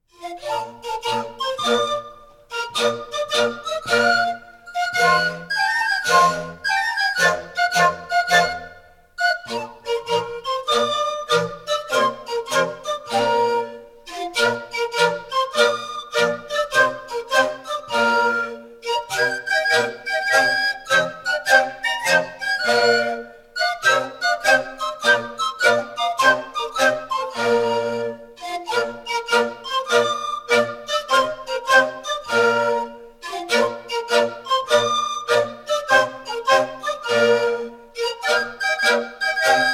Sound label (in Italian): firlinfeu; brianza